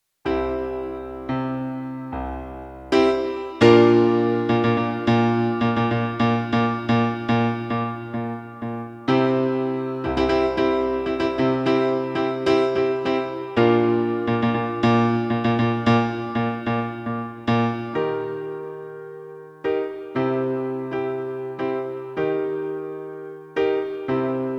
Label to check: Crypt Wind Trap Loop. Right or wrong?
Wrong